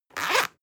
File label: zipper open fast; objekte; reissverschluss